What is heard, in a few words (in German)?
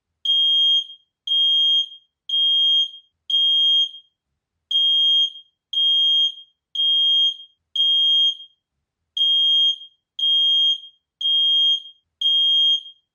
kohlenmonoxid alarm; flori plus 25; co melder test